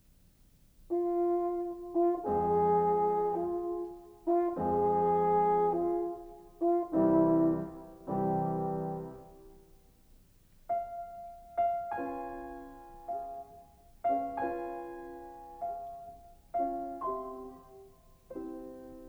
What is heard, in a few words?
Horn; Beethoven; Horn Op17; Ege